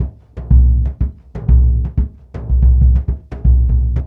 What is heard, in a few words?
120 RIO, Low Drums, Ethno Drums; Spectrasonics - Supreme Beats - World Dance